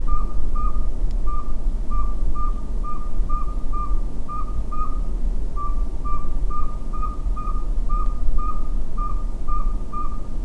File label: Northern Saw whet Owl